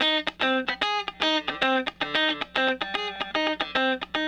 Raw Funk Guitar

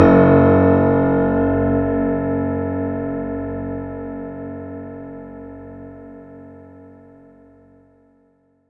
F#1, synth; 90 s Sample CDs; NiceBeats; SynthLine VOL-23

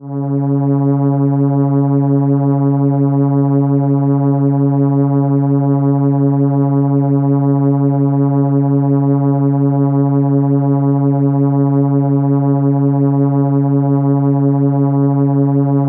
Cello C#4, ARP Solina

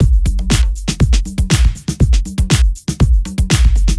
4 floor beat, trance

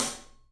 Studio Hats, 3DPercussives

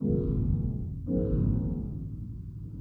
PAD, Hip Hop and R&B; Drumloops; Best Service; ProSamples vol.01; AKAI

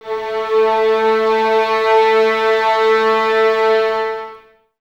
violins A 3, string ensembles; Miroslav Vitous

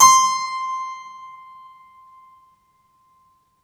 Piano Harpsichord c5; TrashLibrary

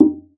Conga, Session Instruments

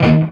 chunk D#, heavy metal; Greytsounds

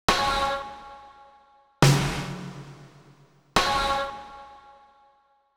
Beat Snares, Grime, 138bpm; Zero-G Sound Sense Grime Scene; Construction Kits; Da Suspects

